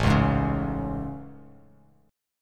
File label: A Major 7th A; AM7; strummed; JGuitar